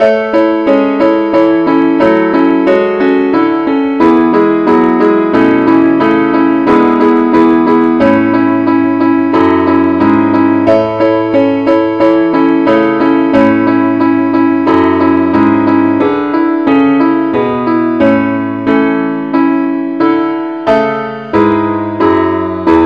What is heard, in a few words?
keyboard only key A